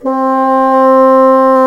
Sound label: C4, Bassoons; Roland LCDP04 Orchestral Winds; 90 s Sample CDs